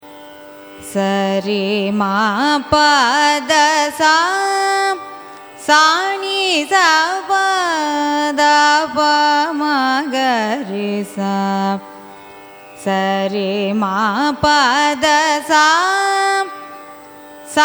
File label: raga asaveri arohanam avarohanam; carnatic music